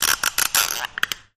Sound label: Thumb Screw Turns, gears